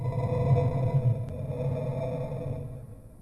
monster; ゆめ2っき

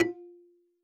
marimba kalimba; ゆめ2っき

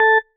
marimba tissue; ゆめ2っき

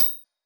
marimba; ゆめ2っき

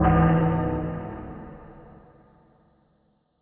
chime

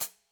marimba drum; ゆめ2っき

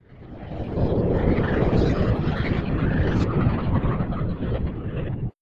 reveal; ゆめ2っき

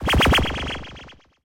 wind wewewew

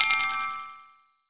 sparkle thing

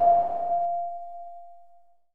pon

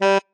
marimba bat; ゆめ2っき

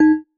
marimba glasses; ゆめ2っき

